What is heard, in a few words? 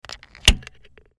can pullup